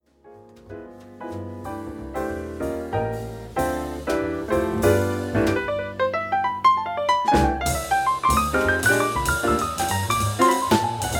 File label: piano solo only, jazz piano